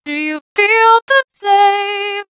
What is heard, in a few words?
synth, vocal effects; DDSP